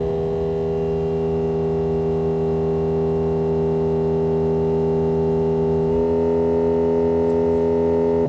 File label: buzz from dash buzz, sc430; sc430 2nd gen 2001-2010